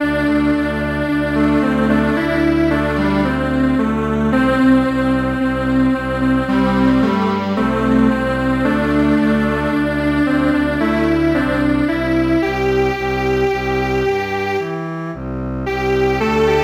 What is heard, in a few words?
Strings Quartet